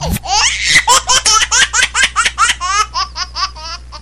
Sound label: Baby Crying